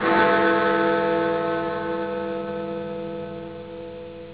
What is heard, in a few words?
bell; 3d real time